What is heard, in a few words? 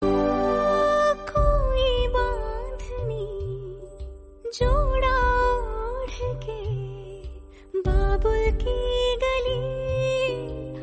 loving song female version